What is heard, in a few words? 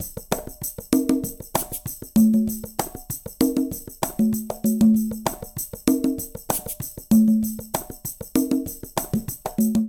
Soul 97bpm, Latin Percussion; Ableton; Factory Packs